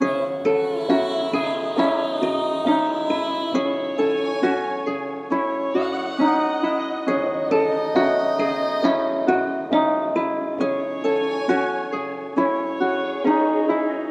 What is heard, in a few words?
136 BPM C# Min, melodies